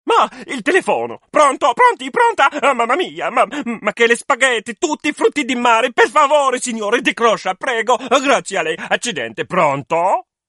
italian pronto tune ringtone

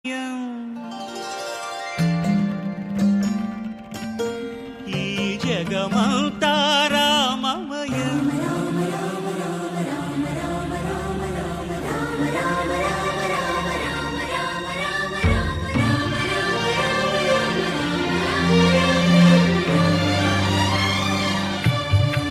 ringtone; sri ramadoss

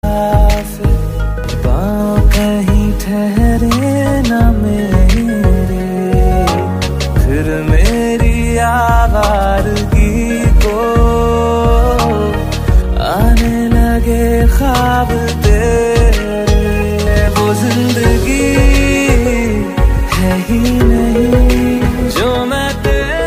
emotional ringtone

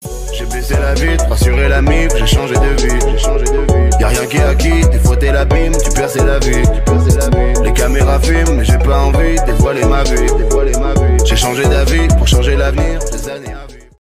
rap hip hop ringtone

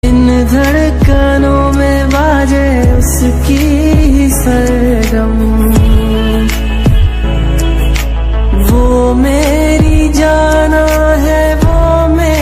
sad ringtone

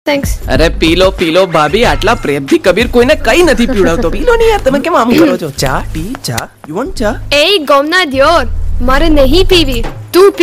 gujarati sad emotional ringtone

